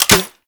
Goo Gun Mech; Warframe; Grineer

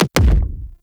Weapon Punch Double

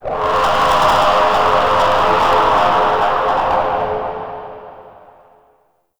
Resonating Didgeridoo; Warframe; Shadowgrapher

